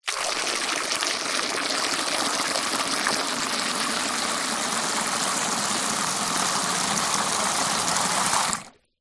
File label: water spray into small glass container; nature